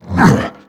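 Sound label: grunt attack, creature; GammaAge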